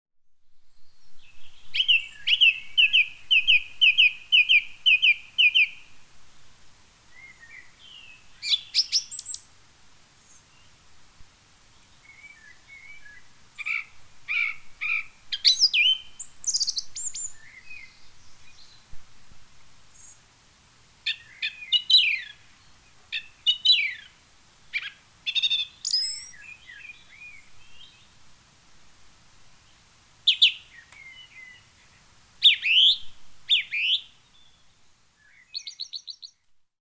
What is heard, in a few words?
thrush; Song Thrush; Highlands; started today (March 3rd) as the Song Thrush started singing